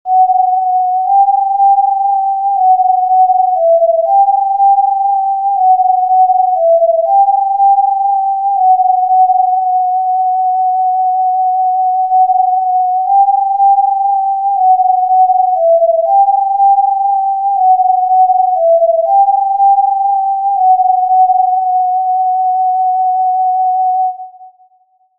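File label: BARCAROLLE TIN, Tin Whistle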